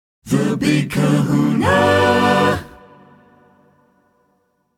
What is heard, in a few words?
Jingle; Short Attention Jingle Show IV